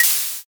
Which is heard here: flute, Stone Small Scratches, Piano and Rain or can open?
can open